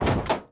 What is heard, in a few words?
robot step; teardown ps5 windows xbox series xs gamerip 2022